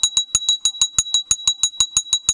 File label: glass